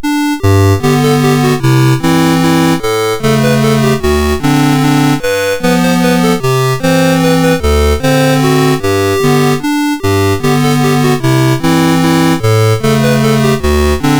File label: We wish you a Merry Christmas, MOS6581